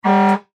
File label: Fire Truck Horn, Fire Truck Horn Honk Short Interior; Emergency; Emergency Vehicle Sound Effects